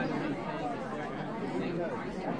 restaurant; Sound FX Real Life

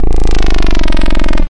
desecrated bass hit, evil bass sounds; Desecrated Productions

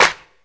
clap, drums single hits; Claps

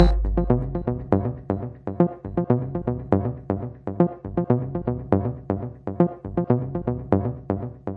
echo synthmelody, synth and electronic loops

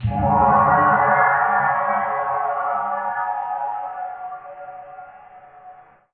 Matal Hammer, cyborg kit; UNUSUAL KITS and FX

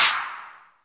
noise clap, drums single hits; Claps

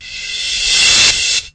many back, Cymbals; DRUMS (SINGLE HITS)

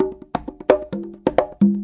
090 bongos, 090 bpm; Bazillions of Bongos

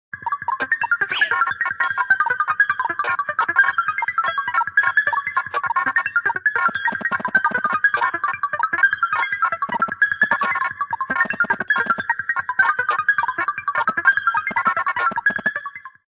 musical computer journey, Cartoon FX

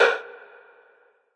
wood percussion verby lo; Western and Latin Percussion

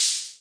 rhma hh, Whippany Rhythm Master; drum machines; 1970s

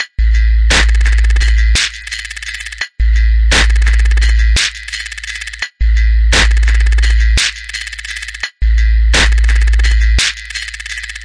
DRUM LOOPS and BREAKS, 085 bpm; bigfish tweakytwirky

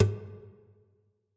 Nylon Hit, guitar body and string hits; UNUSUAL KITS and FX

